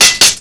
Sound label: sword hit; Pirates Of The Caribbean; DUEL